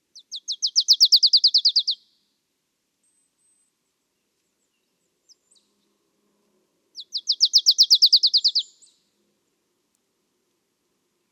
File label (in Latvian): poepal dziesma; a.celmins